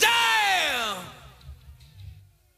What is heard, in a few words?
"yeah" SUNG